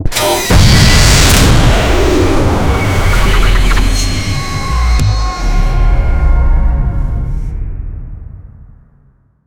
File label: weapon